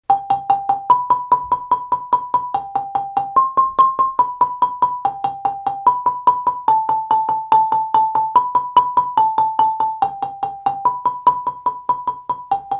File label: lead high rolling, marimba; kare mugomba